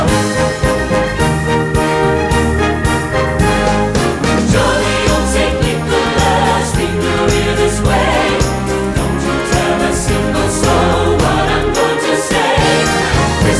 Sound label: Jingle Bell Medley Jolly Old St Nicholas vocals; Sawgrass Elementary